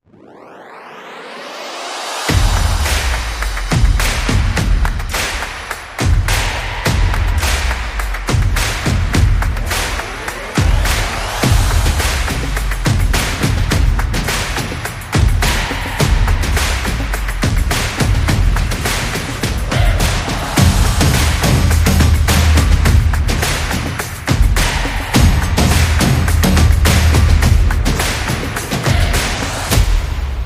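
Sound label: Bootcamp, sport and action music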